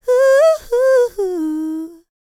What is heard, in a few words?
LICKS, Vocal Planet, R&B; ILIO; Dance; LT R+B